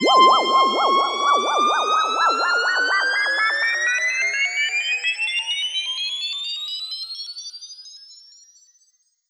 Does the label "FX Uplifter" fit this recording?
Yes